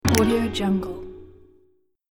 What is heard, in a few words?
asian button